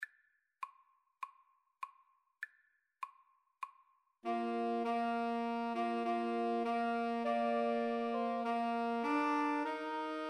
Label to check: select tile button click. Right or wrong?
Wrong